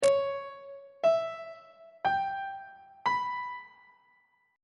f flat melodic minor 7th chord 6 is d flat half diminished 7th; on treble clef